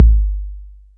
808 Kick

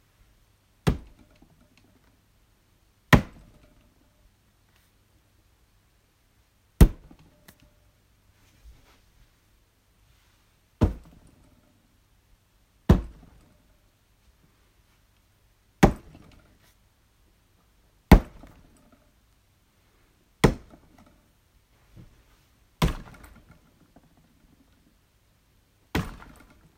Foley Body Impact Hit Shelf

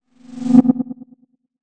Teleport

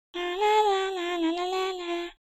Lalala

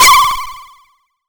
Shock

